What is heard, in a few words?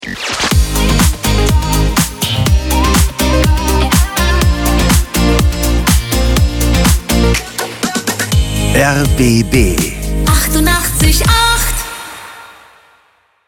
Transition Instrumental Logo